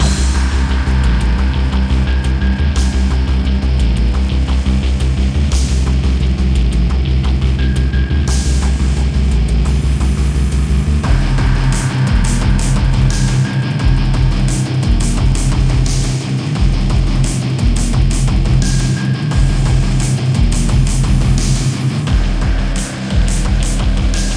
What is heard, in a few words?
Menu Music; Unkilled